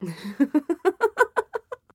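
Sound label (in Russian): malicious teasing laugh of seductress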